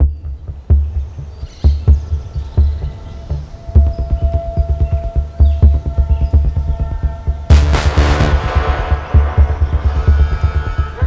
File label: Music Loading; MP Abandoned